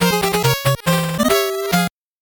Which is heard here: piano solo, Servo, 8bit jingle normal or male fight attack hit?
8bit jingle normal